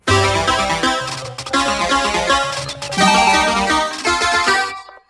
World Clear Arrangement; SMBSS

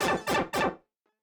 Power Down; SMBW

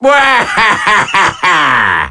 Alarm Clock

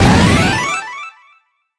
Dash; MK8DX; Waluigi Pinball; Mario Kart 8 Deluxe

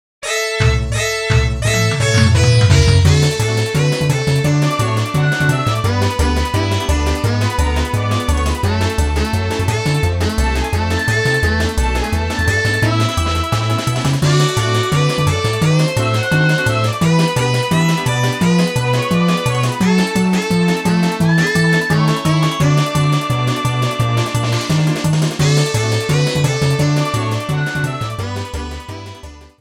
Slide Theme

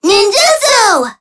Alarm Clock shut off; Kat & Ana

